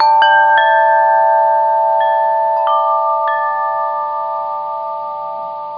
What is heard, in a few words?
chimes